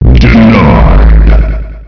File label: denied